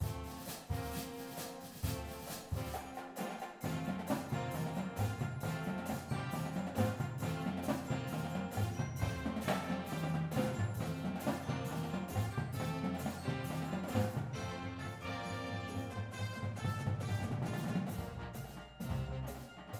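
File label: full drumline with music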